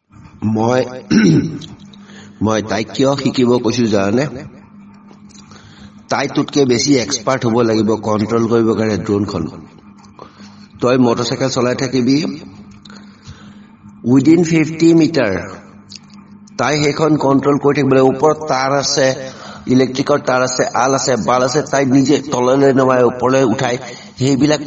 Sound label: Drone